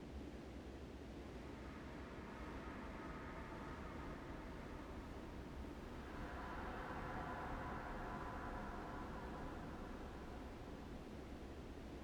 atmos citadel halls; Hollow Knight: Silksong

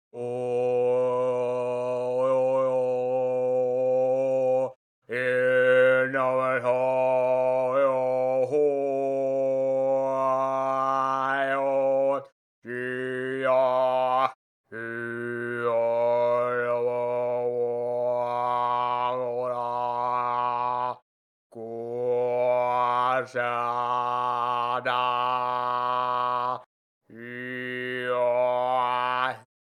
sing; Hollow Knight: Silksong

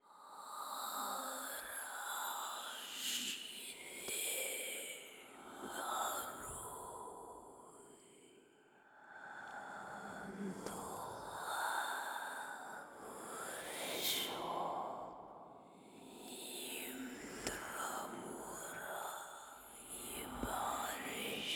weaver whisper loop

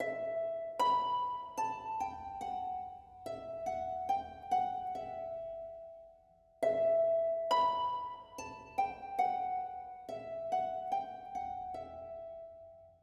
melody a needolin loop; Hollow Knight: Silksong

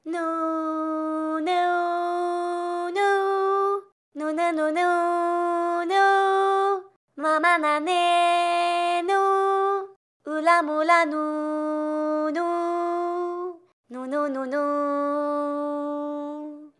sing

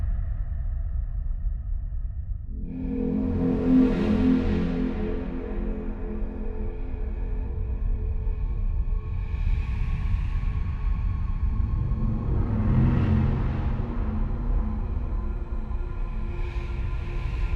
tense; Hollow Knight: Silksong

